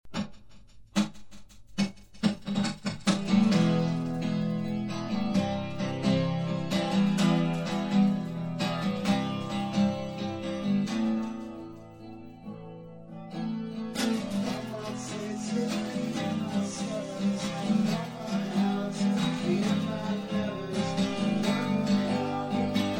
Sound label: acoustic